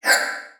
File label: Multimedia Internet CD-Rom Flash Whoosh Short